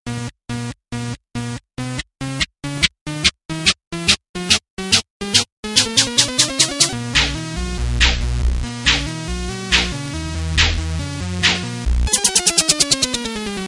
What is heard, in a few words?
Gong E; Zombo